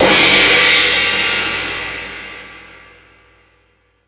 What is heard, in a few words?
Thundadome, E-MU Pro-Cussion